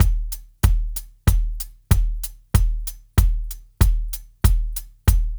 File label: DRUMS, Funky Ass Loops; East West